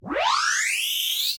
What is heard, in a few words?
Tape Start